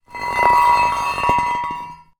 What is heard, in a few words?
metal post driver drag concrete ground; industrial